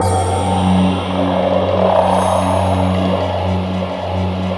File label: F#2, bamboo